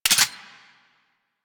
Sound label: Glass Shotgun Mech; Warframe; Tenno; Weapons